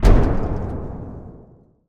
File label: warship attack cannon; ships siege